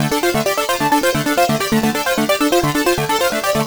Jx bump Dm 131, synth loop; Acidized; Synth Loops; Zero-G; Soundclash Nu Dance Electro; 131bpm

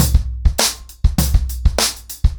100BPM, ACOUSTIC DRUMS

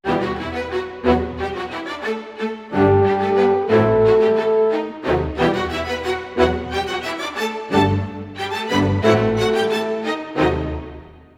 90 e min full mix, Orchestral arrangements

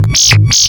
alarm, FX & ATMO, ALARMS & ALERTS; Techno Trance; Techno Trance Essentials

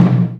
LARGE DRUM, JAPANESE PERCUSSION; AKAI S6000 CD-ROM - Volume 5; Ethic

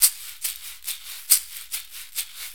6-8 Caxixi, Perc Loops

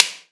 CLP, Drum Machines; 300 Drum Machines; E-MU Orbit-3

